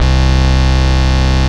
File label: Synth Cuts; Vengeance Samples; VES1 Instruments